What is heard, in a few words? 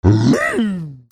CARTOON